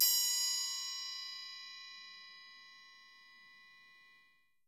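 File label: triangle F, left; Miroslav Vitous - Percussion & Harp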